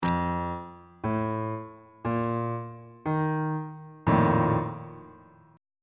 b flat major 7th suspended 4th 2nd inversion on bass clef